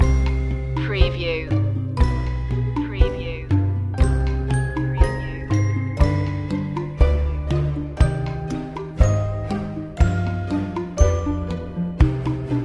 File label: ghostly mansion loop